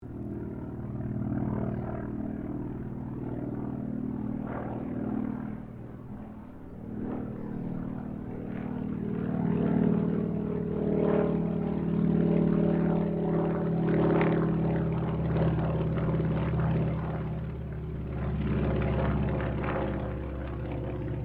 AIRCRAFT CAC WIRRAWAY PASS DISTANT STEREO; CA-16 Wirraway; Airplanes; Vehicles

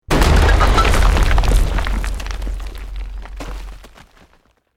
EXPLOSION MEDIUM STONE ROCK

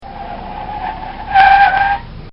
Volkswagon Quick Brake

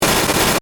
Static Burst Stutter, Static, Burst, Stutter; Production Element, Imaging Element; Accent, Transition; Static Sound Effects; BlastWave

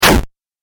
8 Bit Style Damage; Video Game Sound Effects

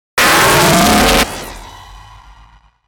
Hit Dark Hard Reverb; 5.1 STEREO MIX; Blastwave

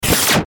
Glitch Tape Rewind Warble, Glitch Fast Stutter; Production Element Imaging Element Accent Transition; Blastwave

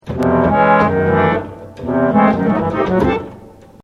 Organ Phrase, Church organist plays phrase.; Keyboards; Musical; Yvette Jackson

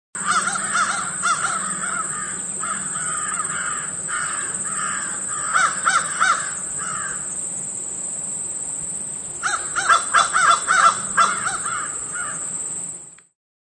Multiple crows far and wide calling and cawing; Birds; Animals

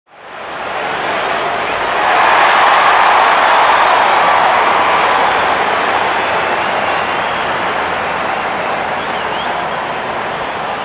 Sports Crowd Cheer; 5.1

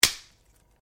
Branches Dead Snap; Material components for constructing more complex sounds; Snaps (Sound Effects:Objects)

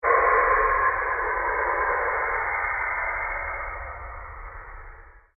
PULSE LONG SWEEP; Sound effects created by manipulating other sounds; Sweepers; Sound Design

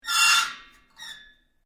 Metal plates scrape; Materials; Foundation

